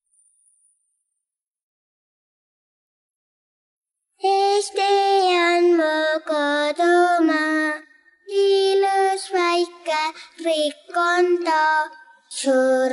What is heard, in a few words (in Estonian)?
Eesti on mu kodumaa vocals